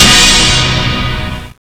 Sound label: orch hits; Rubber Chicken Software Sample Bank